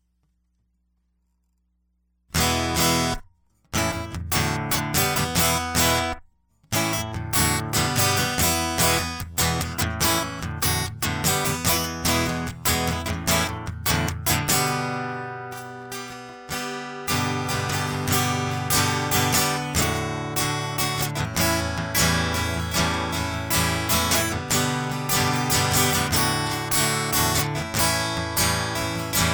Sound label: Guitar Loop